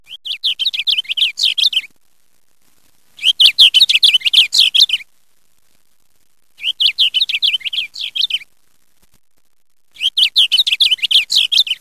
Blue Bunting

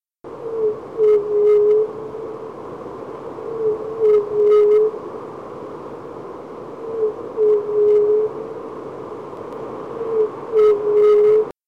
Red billed Pigeon, songs

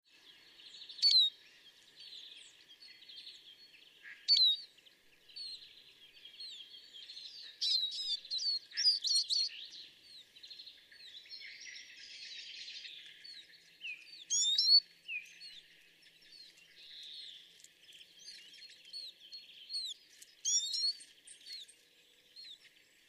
Eurasian Siskin

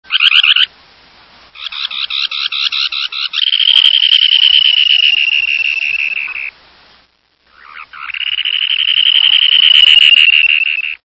Dunlin

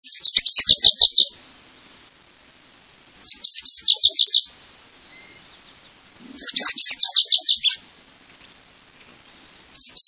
MacGillivrays Warbler, songs

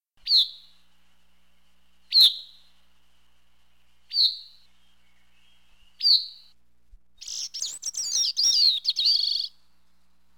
Bicknells Thrush

